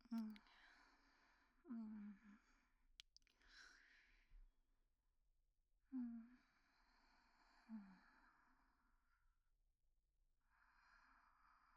寝息・彼氏と一緒に穏やかに眠っている彼女; お色気お姉さん系カノジョ

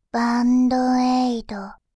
バンドエイド, ASMR, バイノーラル右

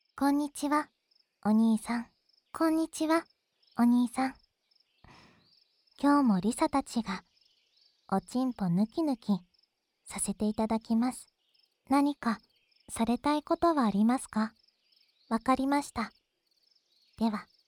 二人で左右から耳舐めさせていただきます

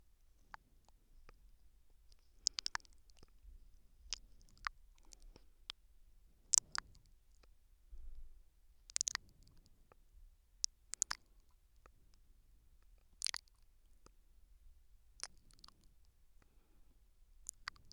左耳甘噛み

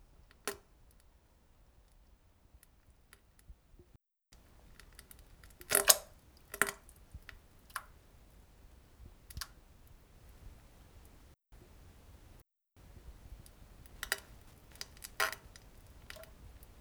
なんべん：ぷっ ぶりゅりっ（ぼたっ） ぶ （ぴちゃっ） （ちゃ）びぅ りゅ

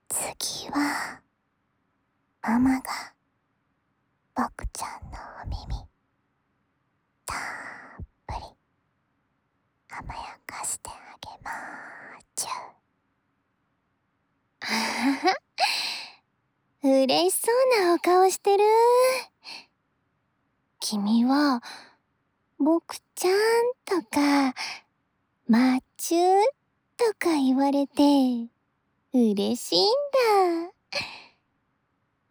お母さんの耳かき（右耳）, ASMR

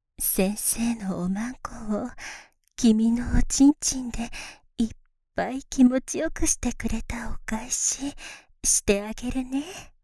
囁き耳舐め; ドスケベ先生とはじめてえっち！; 囁き耳舐めアナル処女喪失 ドスケベ先生

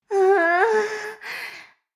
フェラ音声; 牧場戦腺; 少尉

